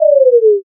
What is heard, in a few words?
debuff